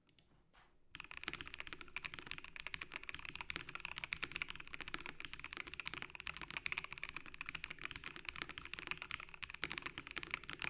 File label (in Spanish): Prueba de sonido Razer Blackwidow V4 75